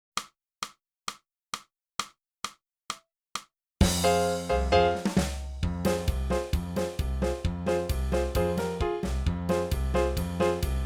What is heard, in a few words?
sax jewish wedding song